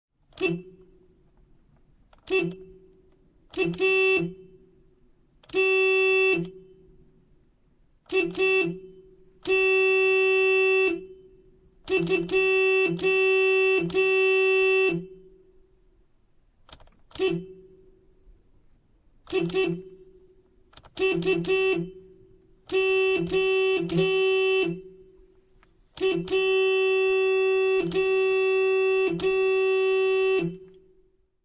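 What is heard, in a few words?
Car Interior Horn Honks Many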